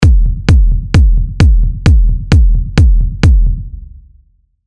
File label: Engine; Serum; tech kicks